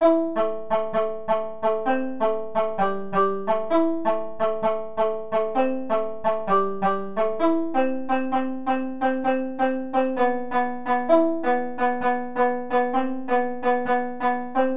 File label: Serum LD Metal